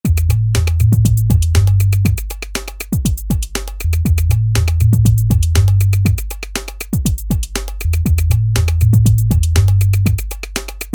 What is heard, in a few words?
House